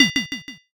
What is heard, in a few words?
plate set